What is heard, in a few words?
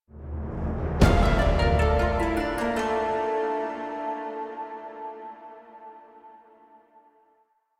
Card Appear Cue, Music; Warframe; Jade Shadows